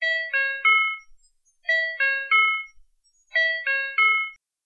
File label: tone gong